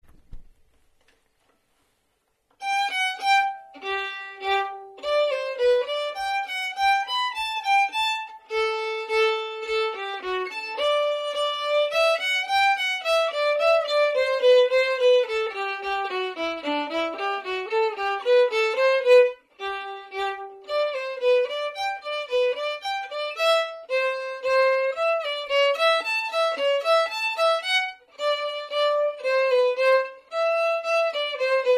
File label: Sailors Hornpipe Melody slow, violin; duets for violin only